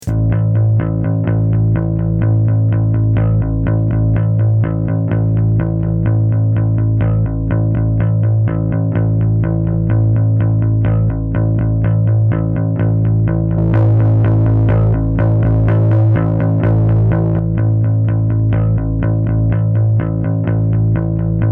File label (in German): Attack und Release beim Bass